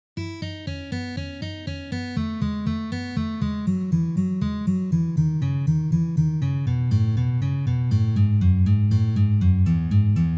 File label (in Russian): Uprazhnenie dlya igry gammy Mi mazhor